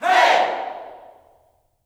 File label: "Hey", Vocal Gospel yell shout